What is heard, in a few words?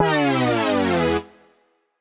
Poly Chord Hits, Ramp Down, E; future rave